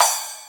soft sounding open hat sample b key, Royality free open hi hat sound tuned to the B note